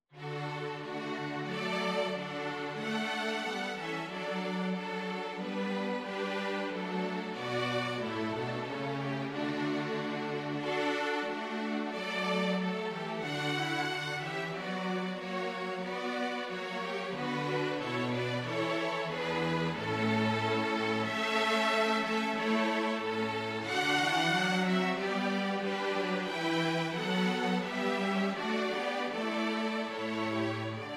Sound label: jazz arrangement